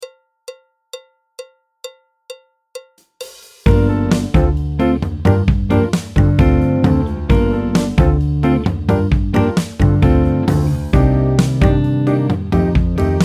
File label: alto, trombone, piano; Jazz Syllabus Level 3